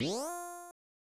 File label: Item Chime; Superball